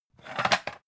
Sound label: pan take slide; objekte; topf